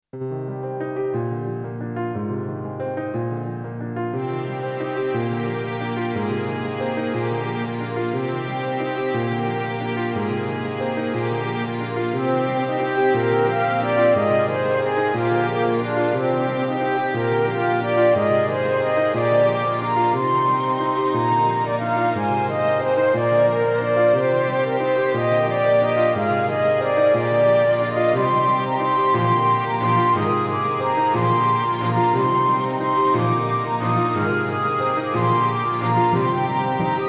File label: Celtic Air